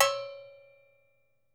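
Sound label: deep cow, right, Percussion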